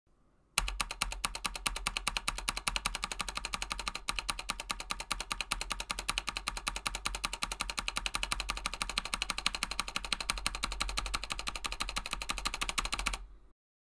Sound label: backspace